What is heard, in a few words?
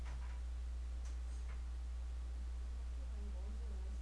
brook